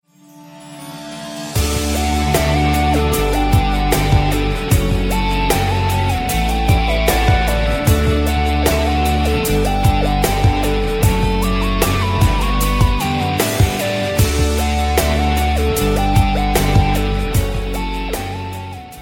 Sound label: Nice Tune